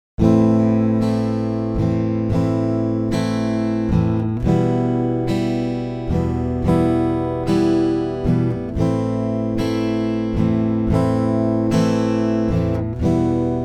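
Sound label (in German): regennacht 56BPM, Loops und Beats; Gitarre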